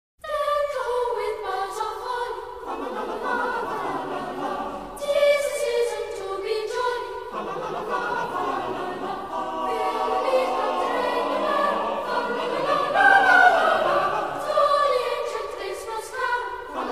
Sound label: Cathedral Choir - Deck The H; Norwich Cathedral Choir; Christmas